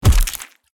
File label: body hit finisher